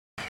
backround noise

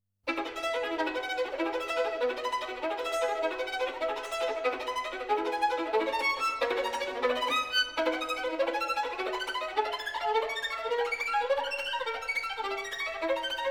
Caprice for Solo Violin in E major; Accardo; Paganini

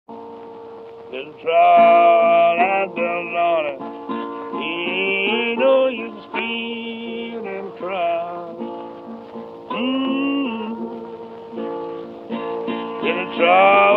Slide Guitar, blues; Jazz & Rhythm & Blues

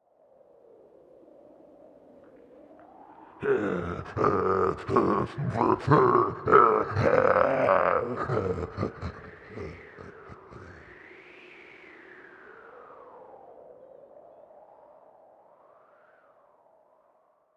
Wolfman Growls; Scary Sound Effects

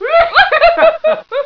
LAUGHTER

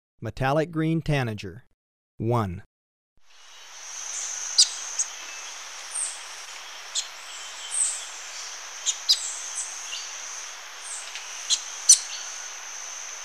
Metallic-green Tanager, Birds of Ecuador; S. America

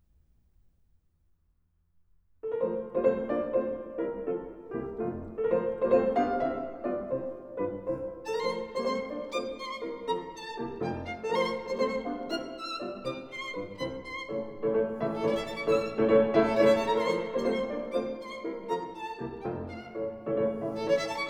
Sonata For Violin And Piano No.5 In F - Scherzo; The Violin; Beethoven

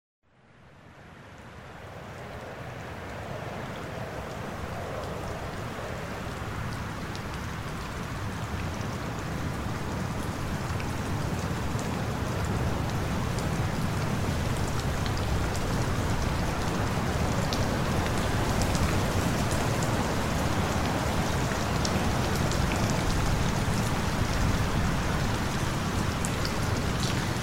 rain and thunderstorms; Sleep Sounds of Nature

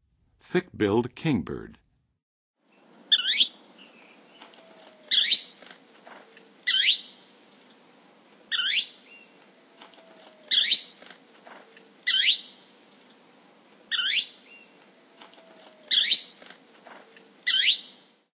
Thick-billed Kingbird; N. America; Songs of Birds of North America; Western USA Songbirds

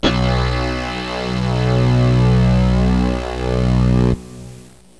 SYN BASS B; MUSIC; INSTRMNT

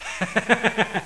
LAUGH

